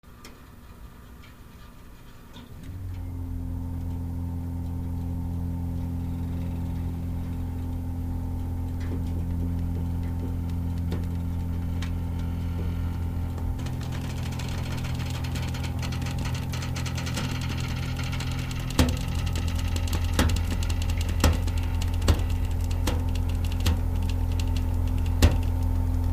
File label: Hot Water Heater; On With Timer And Heater Wind Up, Steady Pipe Creaks And Hiss Close Up, Off